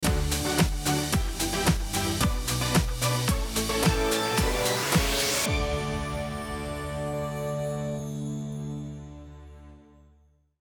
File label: MUSIC Quest End Canons; Equestria Girls